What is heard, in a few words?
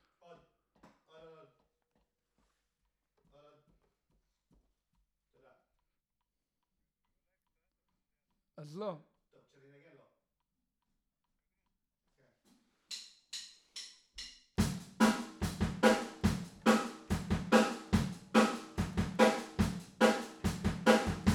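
Guitar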